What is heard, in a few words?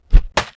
bod strk, bugs; Starship Troopers